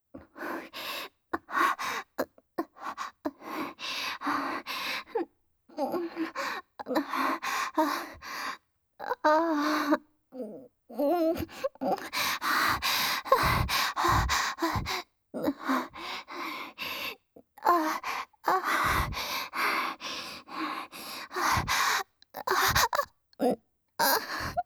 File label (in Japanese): シャワー室密着エロの喘ぎ声ループトラック, ASMR; 高身長バレー部むちむち爆乳彼女との、真夏の蒸し蒸し汗だく溺愛搾精えっち